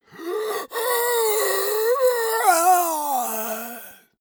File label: monster die; kreaturen